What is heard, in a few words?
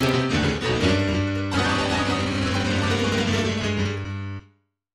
sad trombone